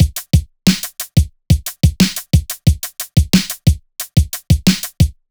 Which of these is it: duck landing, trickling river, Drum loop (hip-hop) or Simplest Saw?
Drum loop (hip-hop)